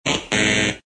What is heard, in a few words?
wrong